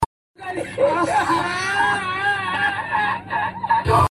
spanish guy laugh

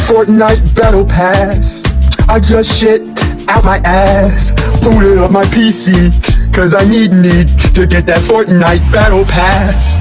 battle pass song; fortnite

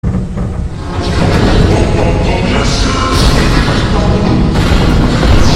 toilet

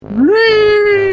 giraffe go reee

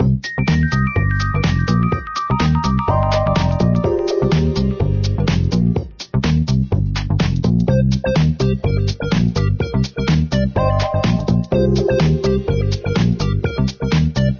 menu music; leappad3

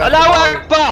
warcry

